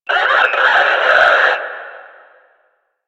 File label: Death, Enemy, Barks, Battle; Warframe; Lasrian; Lasrian Enemy C